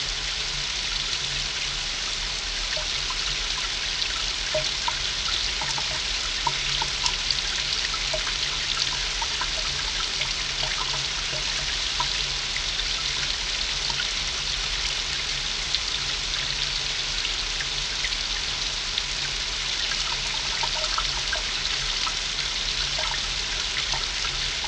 White Noise Rain Drops

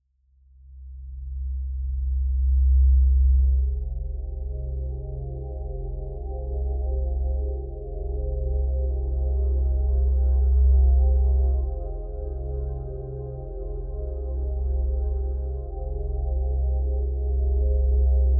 Sounds of Nature White Noise

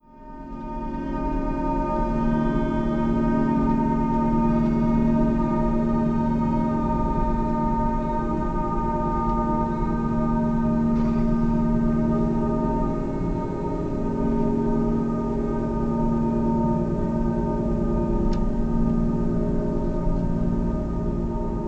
Rain Sounds Spa, Relaxing

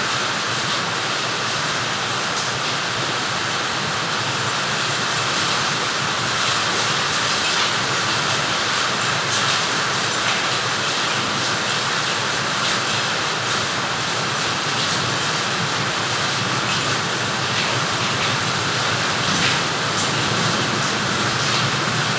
Strong Rain

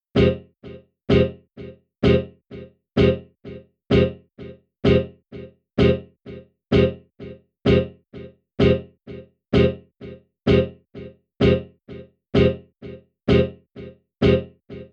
Synth F 128 Bpm

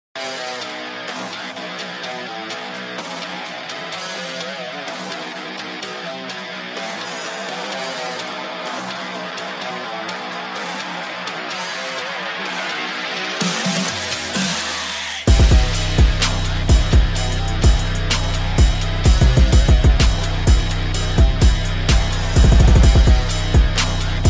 REMIX BASS

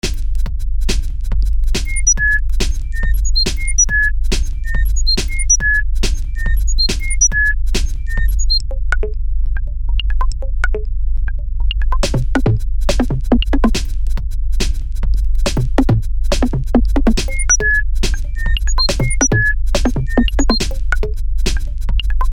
minimal drum

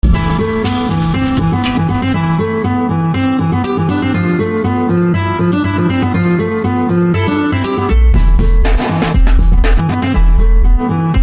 loop grooveboss

